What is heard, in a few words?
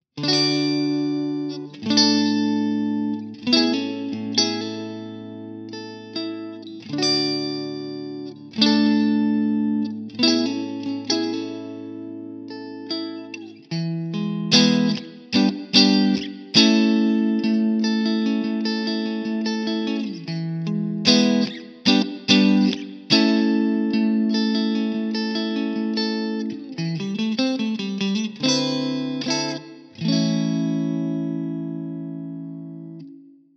clean; jensentone